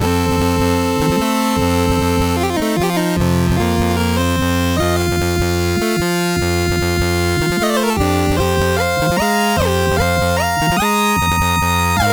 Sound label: Chips, CSG8580R5; A Brown